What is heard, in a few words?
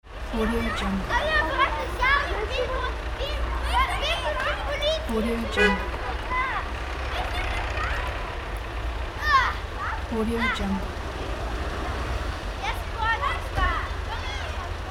children walking on a city street; n-audio